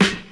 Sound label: dry steel snare drum sample g key; snare drum samples